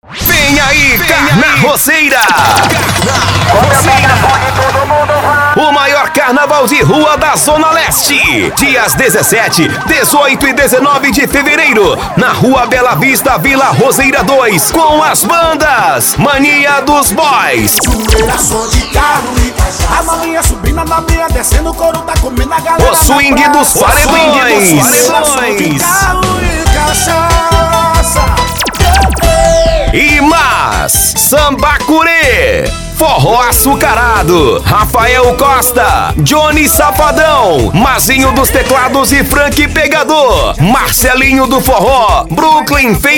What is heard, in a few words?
vinheta carnaval